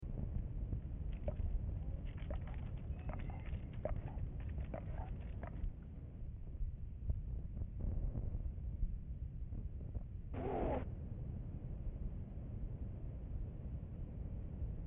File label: clicks, bear sounds